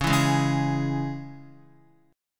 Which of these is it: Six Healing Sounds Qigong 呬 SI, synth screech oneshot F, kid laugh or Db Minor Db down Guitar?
Db Minor Db down Guitar